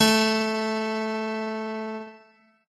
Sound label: piano; pianojs